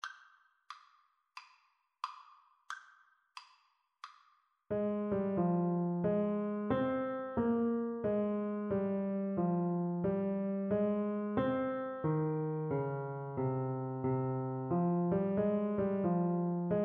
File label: bach bouree alto recorder